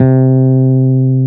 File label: BASS, World of Synthesizers; Soli-Music; World of Synthesizers VOL-1